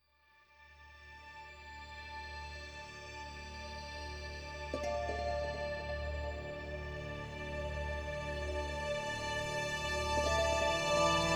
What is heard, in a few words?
Drama strings haunting